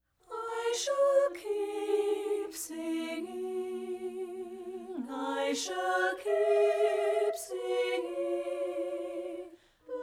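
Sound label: I Shall Keep Singing soprano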